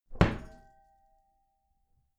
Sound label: wooden door close heavy glass clang